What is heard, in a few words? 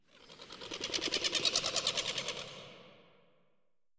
Ambience creature